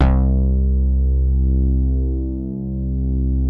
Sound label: C2 BOOGY BAS, NY SYNTH; Northstar - New Gold VOL-2